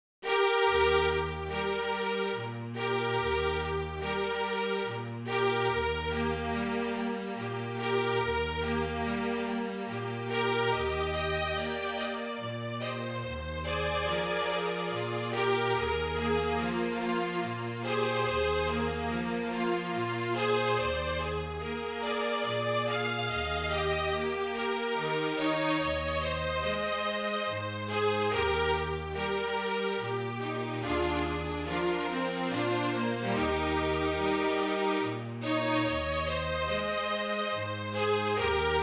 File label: Trio, MIDI, Flute Trio Version; Lullaby (Cradle Song, Wiegenlied) - Brahms